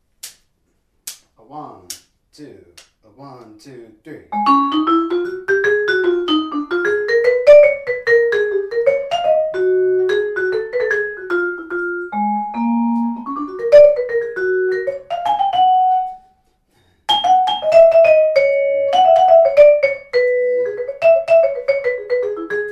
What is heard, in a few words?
Db tune, vibes